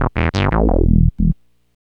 Synth Bass

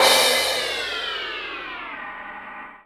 CRASH, Drum & Bass, 150 BPM; Ueberschall; Drum & Bass Resonance

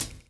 DRUM CHOP; AMG Gota Yashiki Groove Activator

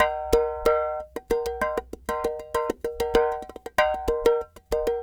clay pot, world percussion

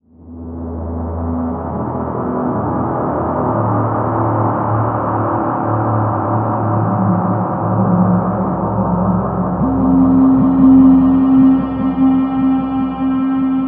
octave, guitars; Big Fish Audio - Alien Guitars; HOLY OCTAVE

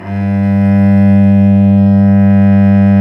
STR VC P, Vc Arco Solo, Arco p nv

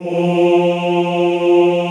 OOH F2, Classical Choir; Best Service; Extended Classical Choir

